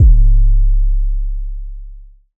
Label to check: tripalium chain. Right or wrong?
Wrong